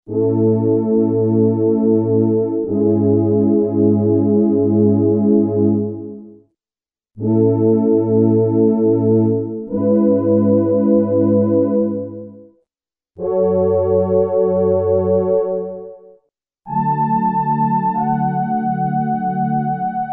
CHOIR, Korg DW-8000; Factory Patches

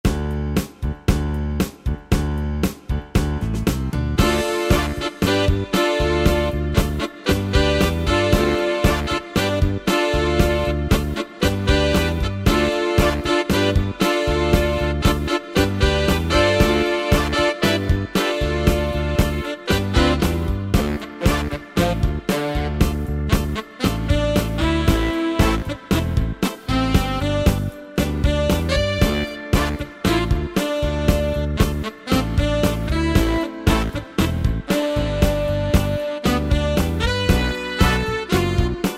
Grapevine Soul; Yamaha PSR-3000

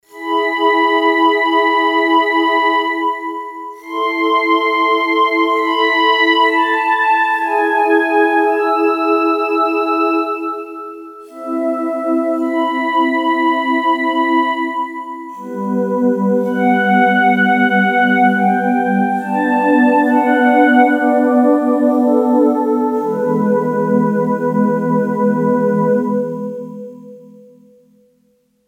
Whisper B, Yamaha TX802; Factory Patches; Voices

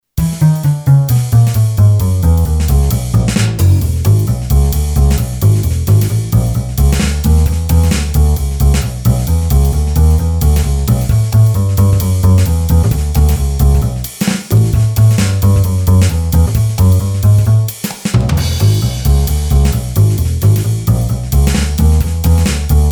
SWING, Boss DR-3

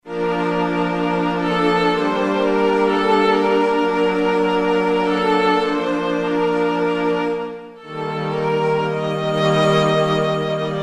Vln & Vcl, Roland SR-JV80-16 Orchestral II

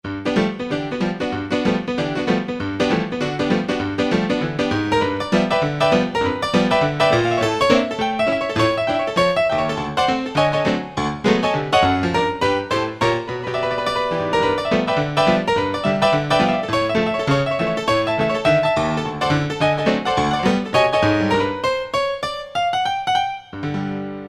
HonkeyTonk, Korg N364; Factory Prog demos

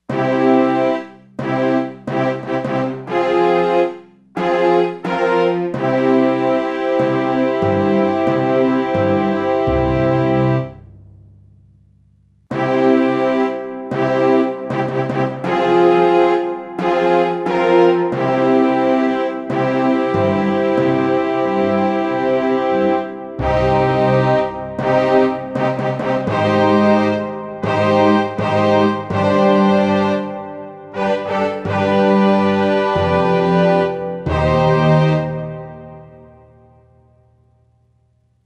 KINGS CHAMBER

